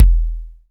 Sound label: Reverb Kick Snare; PS-37 Dance Synths; Percussion